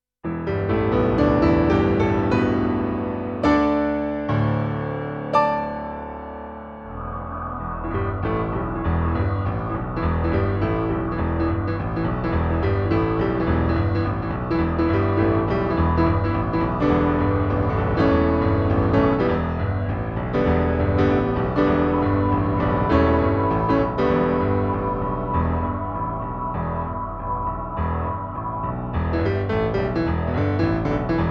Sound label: golden rock piano